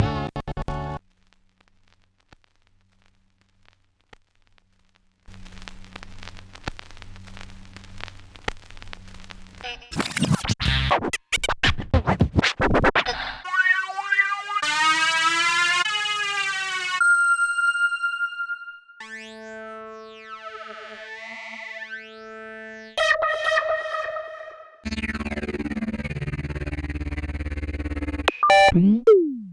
Akai XR-20 Hit

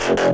Reese design, bass

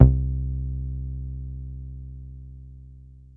303-Style single hits

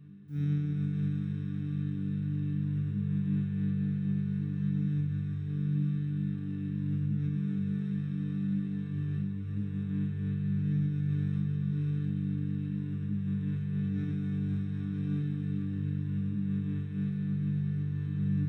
G5 Vox Pad

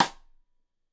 Side Stick Stereo; Deeply Sampled Drums

